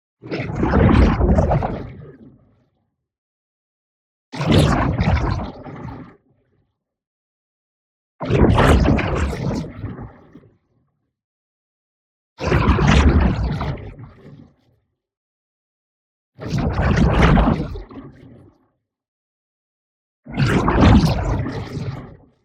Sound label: ABILITY CHARGE Dark Liquid; boom library; Matter